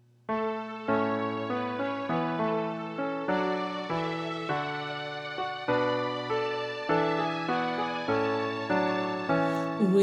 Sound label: Vocal